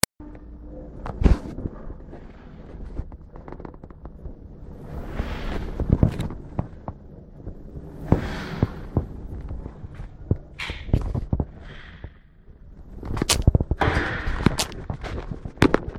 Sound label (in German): aufzug funktioniert